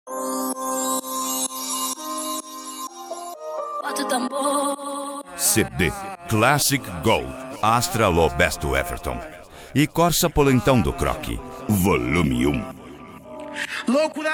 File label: low bass; classic gold astra; corsa polentao